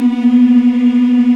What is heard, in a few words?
African Mm p; Roland LCDP11 Africa VOL-1